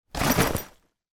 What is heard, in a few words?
rucksack put down; foley